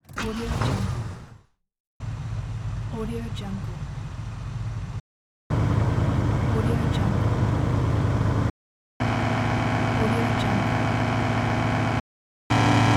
muscle car pack

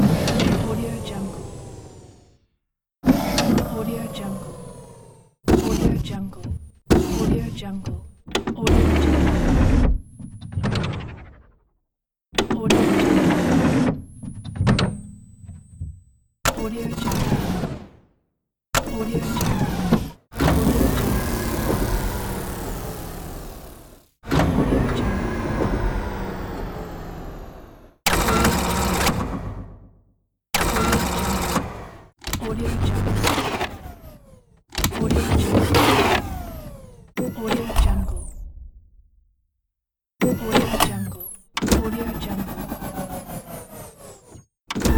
futuristic mechanical power down pack; inspectorj

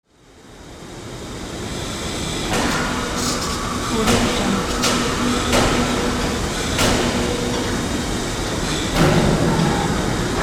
forging shop ambience

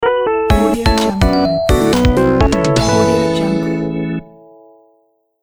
happy stage completion

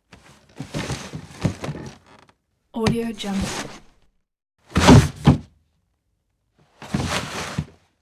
wood bed get up creak

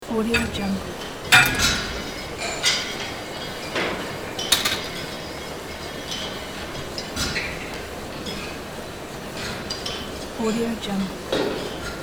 gym ambience

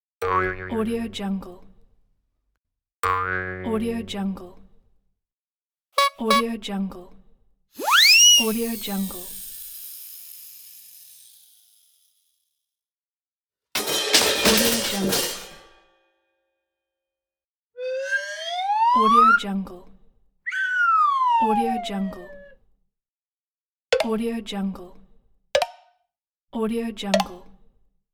funny cartoon bloopers pack; moonaudioproduction

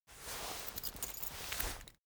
cloth key ring mid size pocket fetch; foley; smartsoundfx

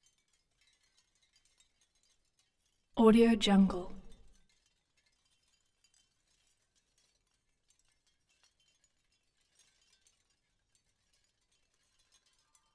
int house fish scale chimes

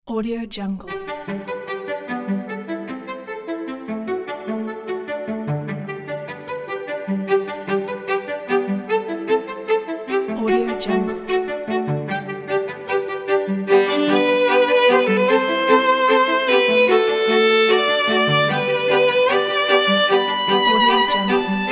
emotional classic cello violins; eddiehoncha

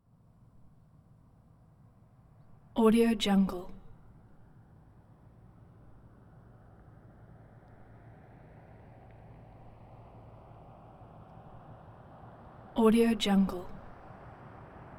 chevy avalanche pickup truck 20mph; 2007; prosoundfx

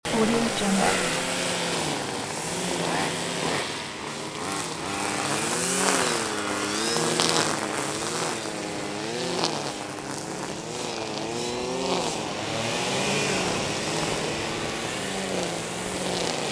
grass mowing in park loop

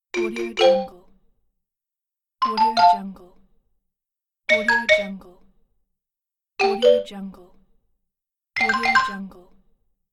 xylophone sms notifications

